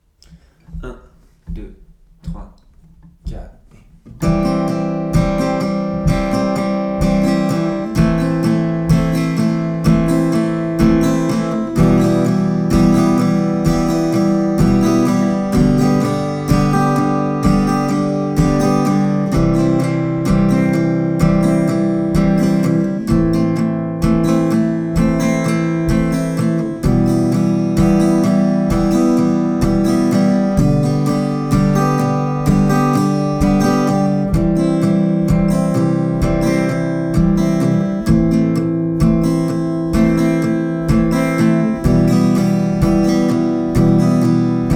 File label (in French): doubles croche, rythmiques; theorie rythmiques